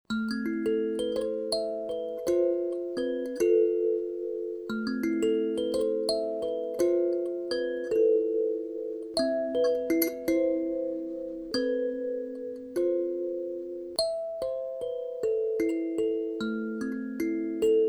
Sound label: sansula